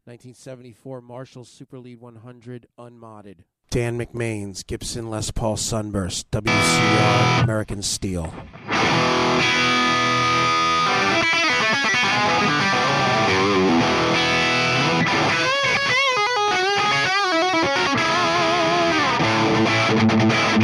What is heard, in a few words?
marshall 74 super lead, bridge; stock